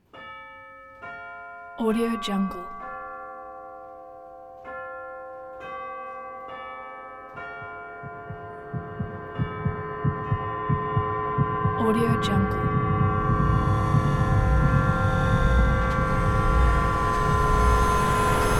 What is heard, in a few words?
eerie city montage church bells builds; prosoundfx